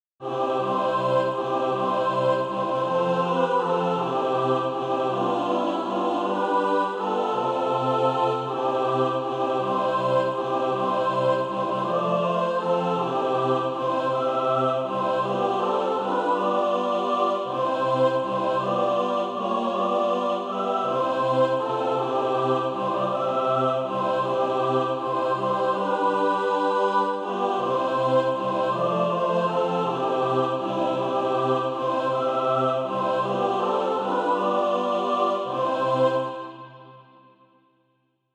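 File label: mixed, Practise Music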